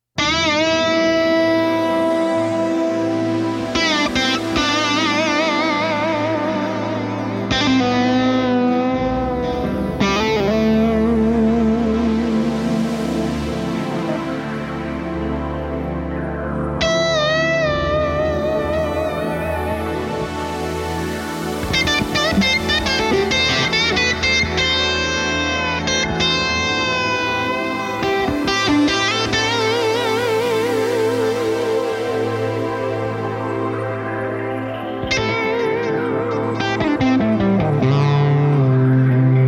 classic mode

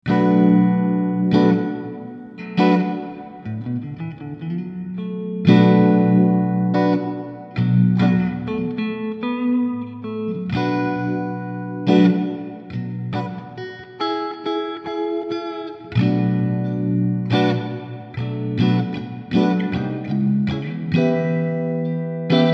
slow vibe blues; effectrode

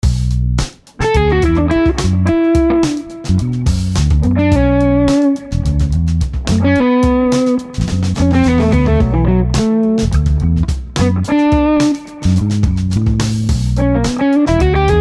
tube drive 335; effectrode

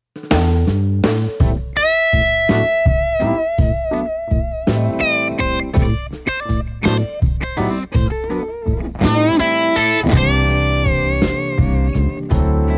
creamy blues

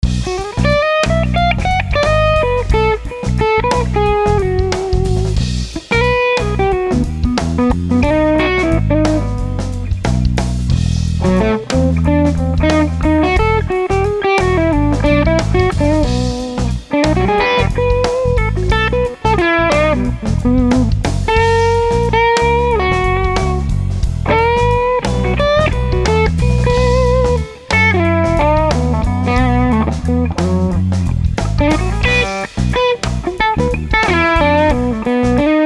tube drive jazz funk; effectrode

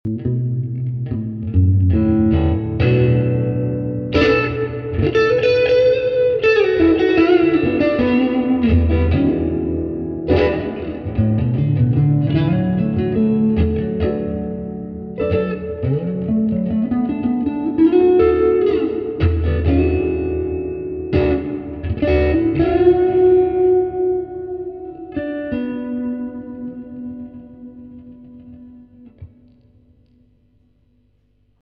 blues breakup, Tube Drive

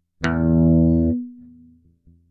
plucked electric guitar string bridge

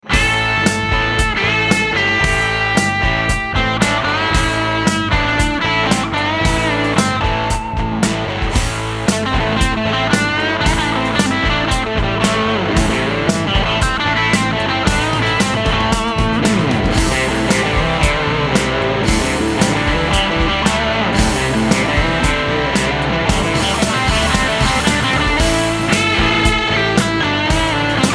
tube fuzz; helios; effectrode

